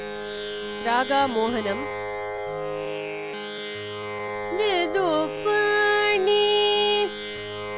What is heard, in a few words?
Mohanam Phrase